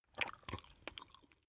swallow food

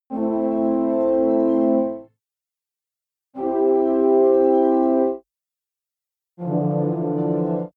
horns chord mystical

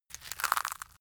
crunchy hard

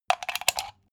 socket plug hard

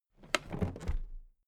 toolbox put down regular

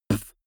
beatbox bass drum damped

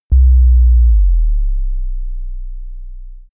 bass drop short

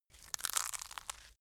crunchy bright

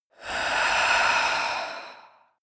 female breath slow out

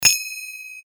finger cymbal

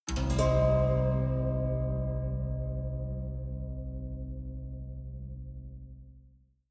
haunted strings chord minor

